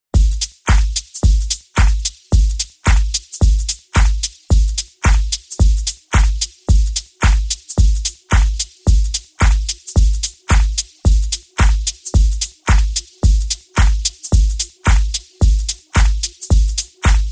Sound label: Deep House, Banjo